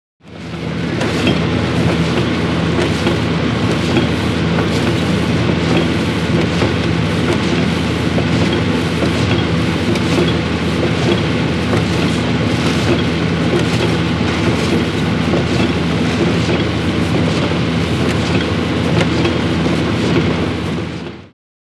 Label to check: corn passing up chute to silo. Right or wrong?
Right